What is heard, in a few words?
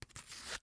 Weapon Knife Combat Knife Sliding Into Holder; AdobeCC